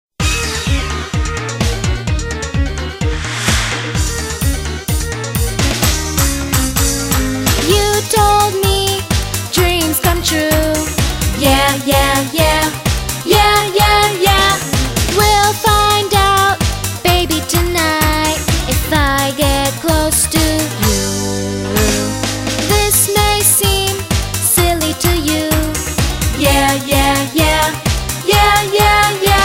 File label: Love Ooh Ooh Paradise English